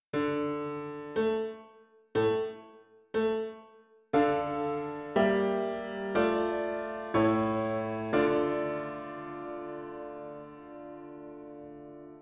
Piano

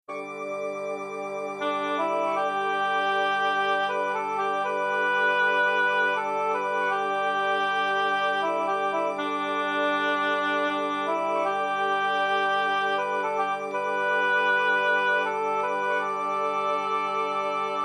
Pent scales

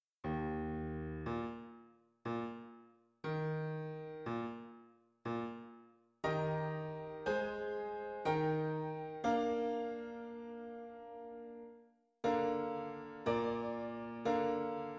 Piano

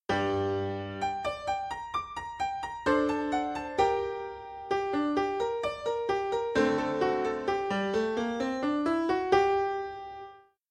Mel scales; Mozart K545 Slow

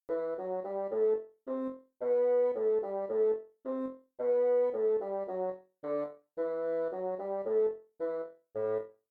Dorian, scales Minor

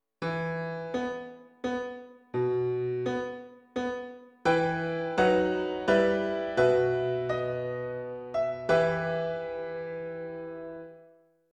Piano

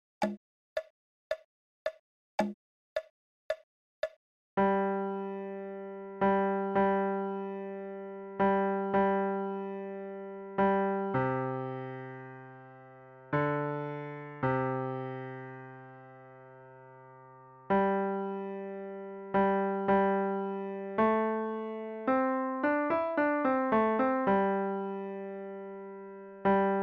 LH; Chinese Folk Song

